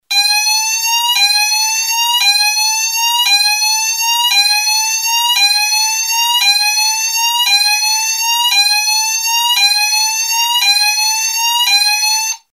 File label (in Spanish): tono